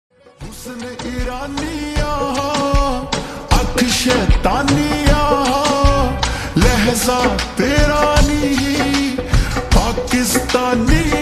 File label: Slowed Reverb Ringtone